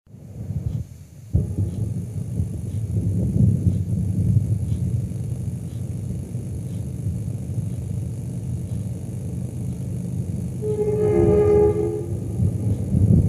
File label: Metroliner Bush River Bridge